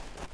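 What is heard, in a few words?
shuffle, footsteps hard surface jump up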